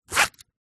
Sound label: wallet unzip coin movement